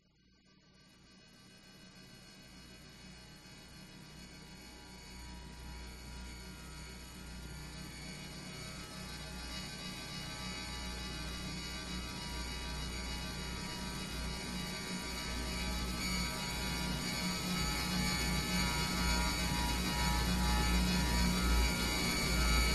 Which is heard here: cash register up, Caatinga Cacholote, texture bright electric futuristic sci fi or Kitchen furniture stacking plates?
texture bright electric futuristic sci fi